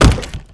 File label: chest wood impact, debris